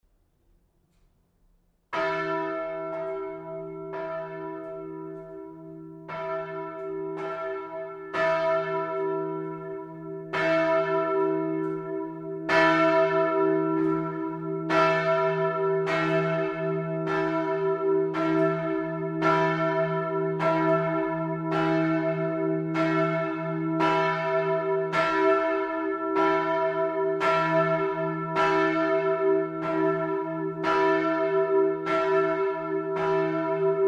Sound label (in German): Angelusglocke